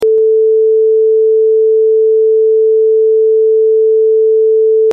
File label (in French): 440 plus 1 dB